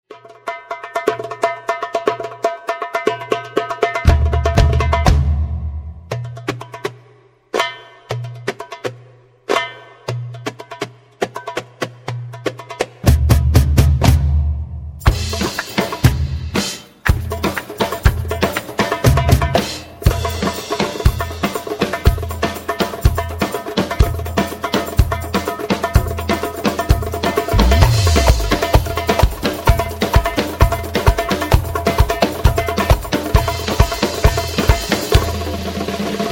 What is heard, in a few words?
drum solo